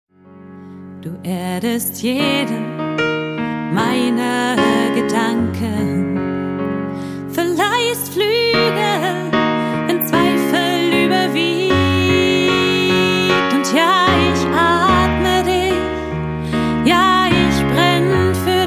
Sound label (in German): Piano Version